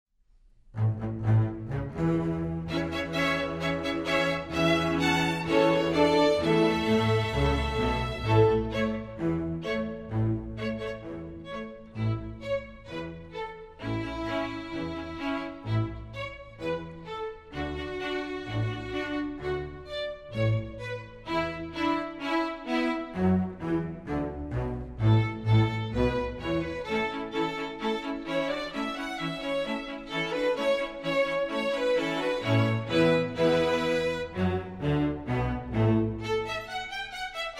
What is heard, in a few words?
string orchestra